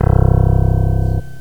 picked bass; gmplay

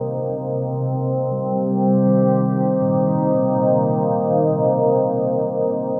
SYNTH PAD AMBIENT 160 C, Ambient; eJay; Sphere

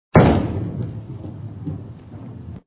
hard Roll; tom jerry bowling

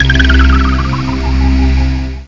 water music

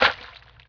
punch; BESTSHOT; noisybit

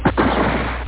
gun

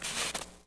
Snow Step, Footsteps

